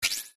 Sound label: pickup; Looney Tunes - Avalanche Snowboarding